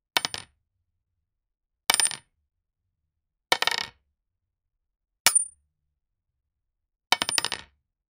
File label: soft object drop sound